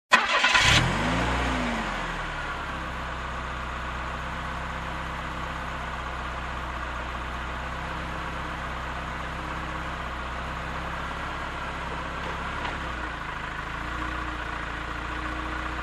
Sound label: Car Engine ignition drive off